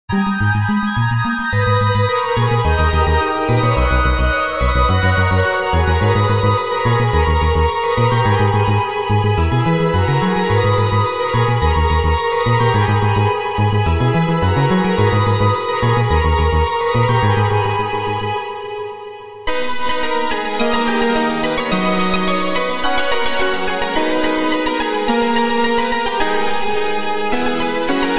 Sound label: Orchestra Pop; Wizardry